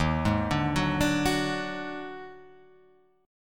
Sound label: Eb Minor Major 7th Double Flat 5th; JGuitar